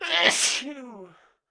Contagious Sneeze; SFX Game Contagious Sneeze

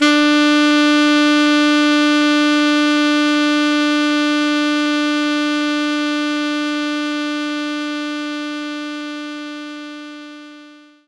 Alto Sax D4

